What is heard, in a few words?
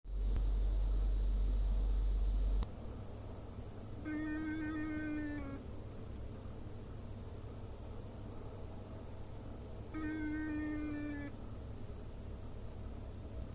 intermittent sound in declination drive